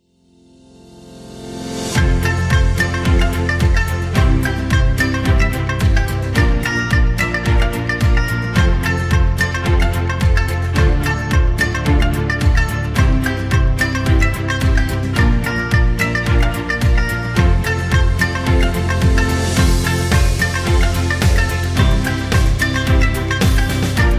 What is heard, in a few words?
Bollywood